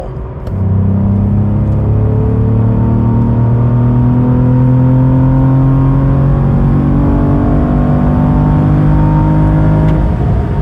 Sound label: Corsa exhaust, CT4 Blackwing